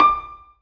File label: zimbal D 5; ZIMBALON